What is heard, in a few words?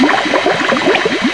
Jetpack Cola can Loop; Rabbids Big Bang; Android iOS Windows gamerip 2013